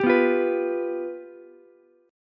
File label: F Suspended 2nd Flat 5th Ukulele, chord; strummed; JGuitar